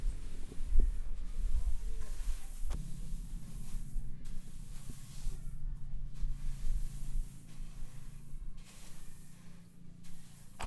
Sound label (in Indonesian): sfx menjemur padi